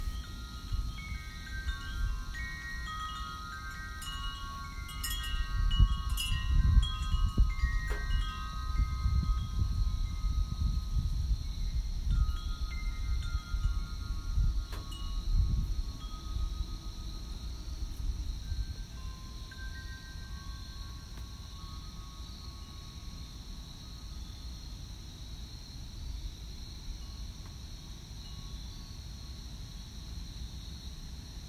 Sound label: Wind chimes in Waccabuc morning